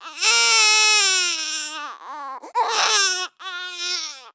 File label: BABY CRY; don t be afraid the first toy; CLOWNHOUSE